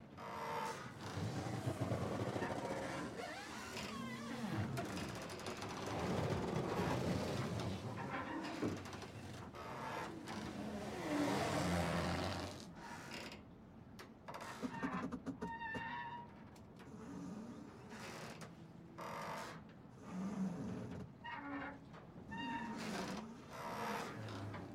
Sea Inside

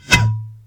Blow Bottle Mortar Shot; Weapons

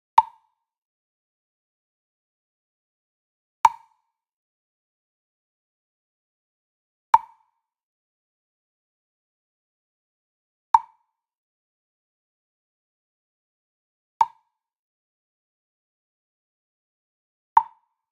Sweetener Jam Block Plastic Hit Near; boom library; Cinematic Strikes Construction Kit

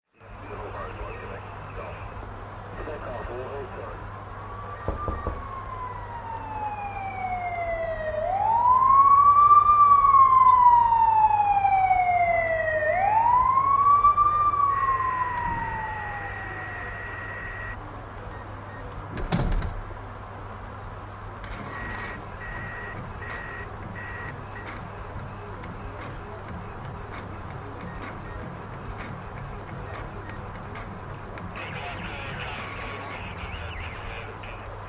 menu office amb loop; Pitch Black - A Detective's Demise

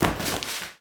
Bodyfall Tile; SFX Kit; Bodyfalls

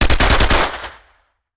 Machine Gun; Sound Ideas; Guns

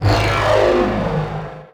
Pickup Scifi Energy; SFX Kit

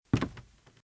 Footsteps - Wood - Creaky - Walk - Right; RadwinIsland

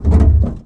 car rattle; Blind Drive

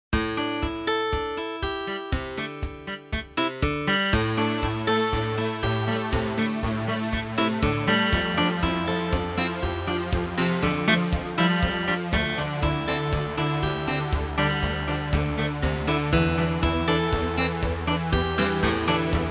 Jingles; 90 DDD Jingles

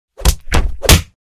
Block-Punch Combo; Hollywood Edge; Martial Arts and Human Impacts

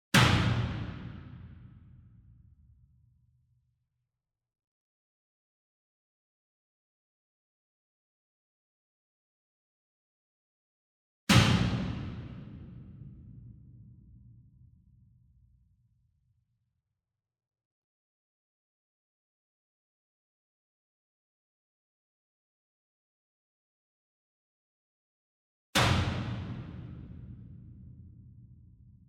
SINGLE DRUM Verdi 66 Inch Hit Whip Beater Far; boom library; Cinematic Strikes Construction Kit

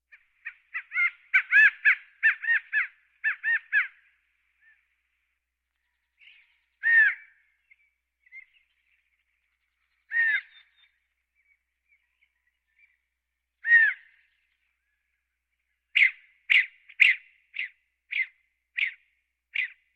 Birds; Valentino Production Sound Effects Library CD50

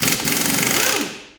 car change wheel, street traffic; best service Blue Box

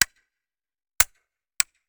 Musket Foley; Sound Ideas; Guns

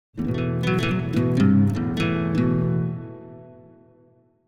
Acoustic Guitar - Acoustic Melody - Higher Part; South End; Hollywood Edge; sound designer's toolkit v2

